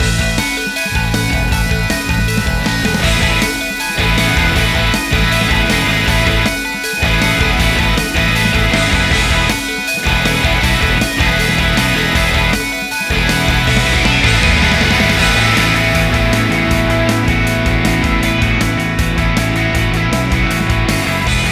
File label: bgm battle